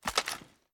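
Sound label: shotgun reload raise; reloads; iw8; romeo870; gmod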